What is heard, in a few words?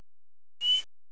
whistle